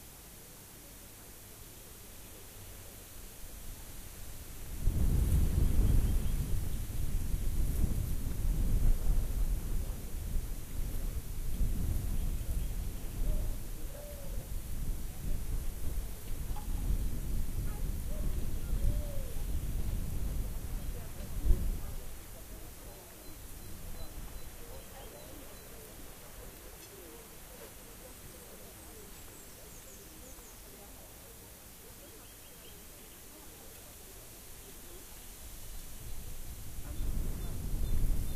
rayol farm; SEAMED

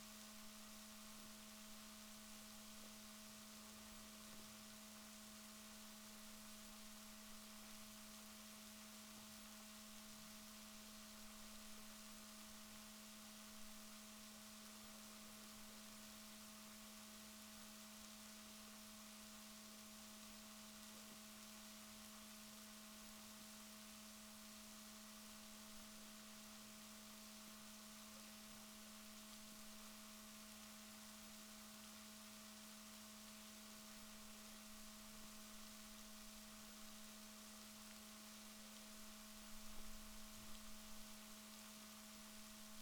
rorquals negatif, Balaenoptera Physalus Chile

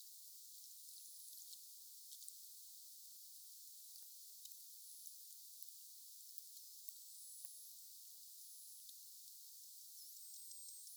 voc, globi

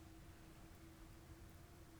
pulses, BALAENOPTERA; MONACO 2022-07

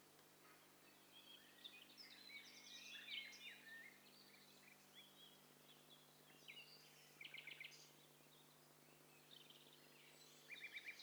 STEREO BIRD COCKTAIL PARTY

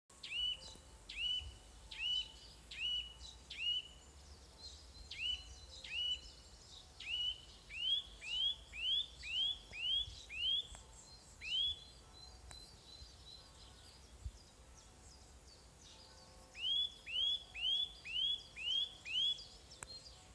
Sitta europaea; SETE

